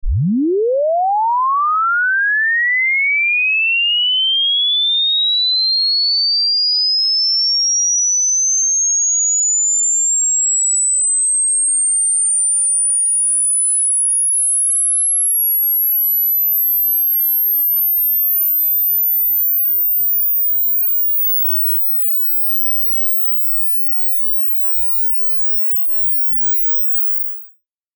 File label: frequency sweep